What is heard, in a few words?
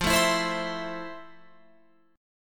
F Major 7th, Guitar, Open F; strummed